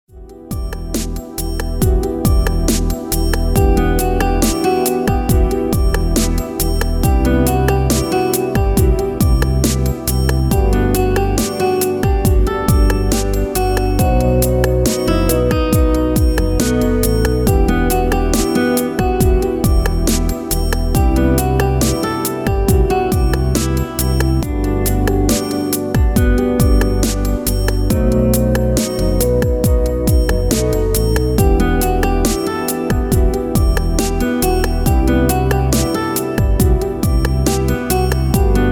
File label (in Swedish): E moll ballad